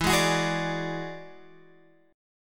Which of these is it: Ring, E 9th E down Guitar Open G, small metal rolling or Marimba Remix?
E 9th E down Guitar Open G